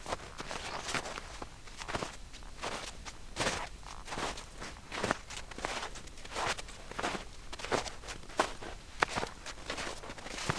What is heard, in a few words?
snow Walk